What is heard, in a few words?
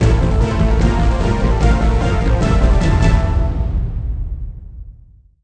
Sting Boss Intro; Green Lantern: Rise of the Manhunters; NDS